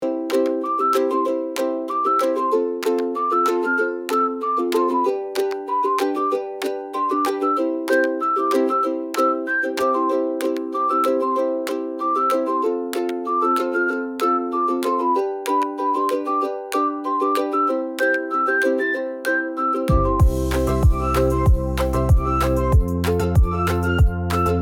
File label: Tropical relaxed coconut breeze, bg music